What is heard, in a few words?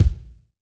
Hiphop kick; Reverb+EQ+Comp+Limit